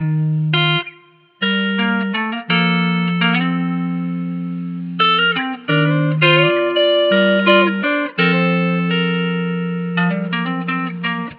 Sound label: guitar, Loops, Phonky